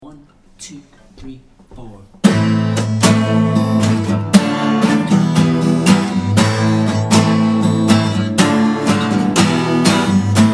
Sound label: Acoustic; rockbandacademy